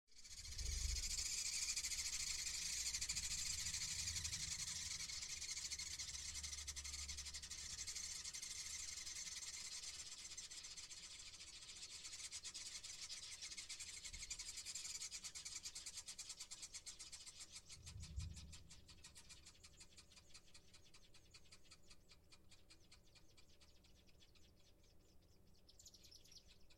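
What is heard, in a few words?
Chimney Swifts in Chimney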